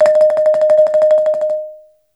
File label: BALAFON, African Melodic